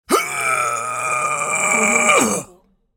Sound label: cartoon big effort moan